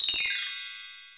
chimes; Bells

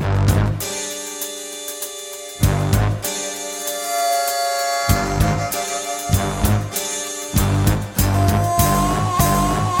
horns, loops

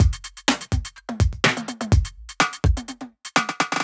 rims, Drum loops Electro

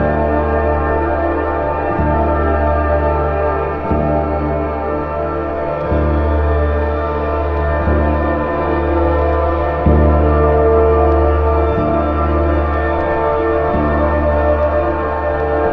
strings, Sad

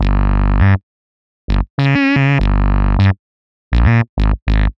airplane down, Electronic Loops

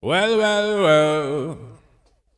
well well well SUNG; ooh ahh

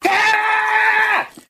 scream

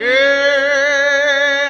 "hayyy", Voice

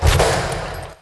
bang crash; Crash Bang Boom

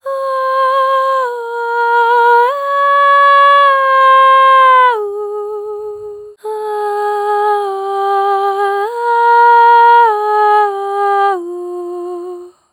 voice, Loops, Sad

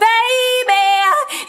"baby" SUNG; Voice; ooh ahh

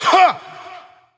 huh, Voice

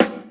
kiwi, Snares Knocks

